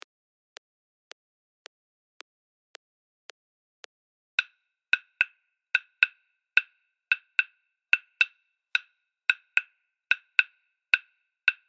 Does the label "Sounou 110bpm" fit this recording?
Yes